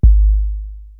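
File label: Bass Drum, Drum Machines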